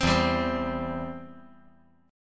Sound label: E Minor Sharp 5th E down